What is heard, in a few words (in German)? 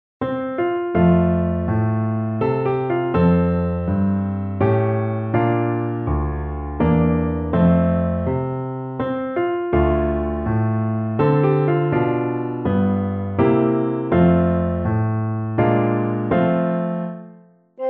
Sopran Tenor Blockfloete + Klavier; Amazing Grace